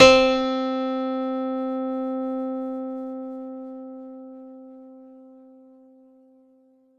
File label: korg ns5r piano 2 c4